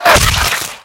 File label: bullet impact, infected gore; npc, bullets